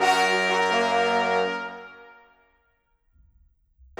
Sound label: Brass